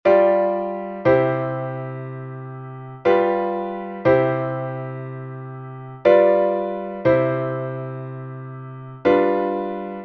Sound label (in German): Altklausel; koch 1802